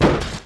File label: golem damage